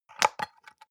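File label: soap dispenser press almost empty short, bathroom; haus-garten; badezimmer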